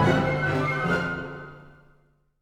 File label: Music Menu 144bpm Stop